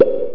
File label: plop